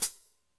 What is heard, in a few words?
Cym Wet Foot, Drum Machines Alesis D4